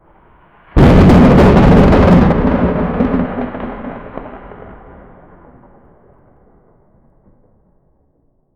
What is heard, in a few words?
a sound for an attack